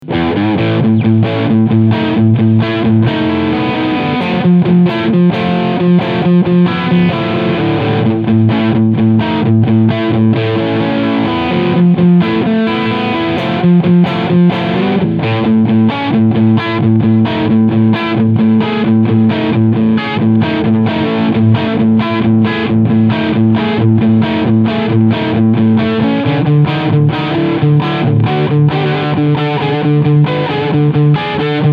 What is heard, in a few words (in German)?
Squier Strat Riff Sound Steg Pickup; 40th Anniv